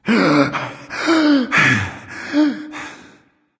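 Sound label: nonverbal breath; smite; voicepack; ullr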